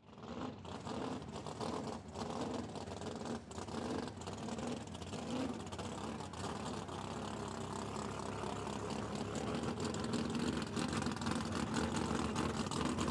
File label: Swamp Buggy Idle; Swamp Buggy Idle Rev Drive Away; Transportation Watercraft; Boat Sound Effects; Blastwave